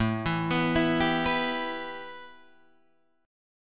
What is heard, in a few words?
A 7th Suspended 2nd A Guitar Open D, chord